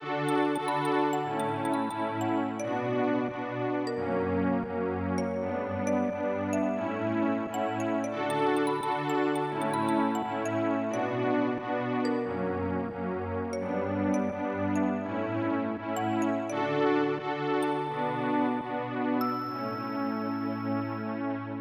DANCE; PC Gamer IT CD 31; DINK

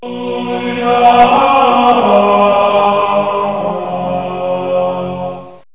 vocal monk; Zoom - Release 2 (1996); Active Software; vocals

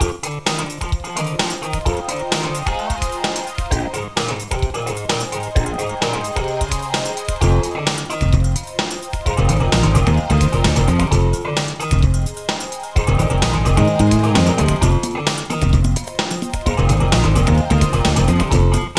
harly, rockpop; Ultimedia

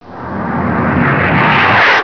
Whoosh

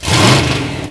ROUND ON; mtm2

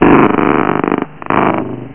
SHRINK; DUKE

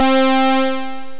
blues synth

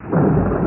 THUN

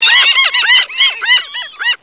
gulls; Comanche

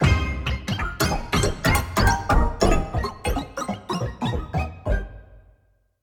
Synt; Evolution 077

